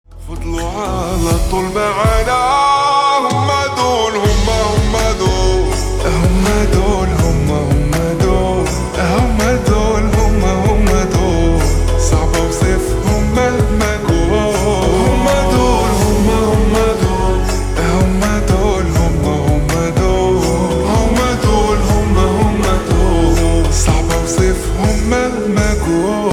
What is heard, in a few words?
Ringtone Slowed Reverb